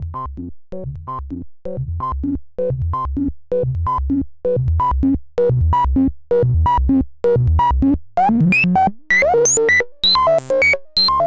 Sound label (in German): Doepfer A-111-5 Mini Synthesizer Voice Hohe Filterresonanz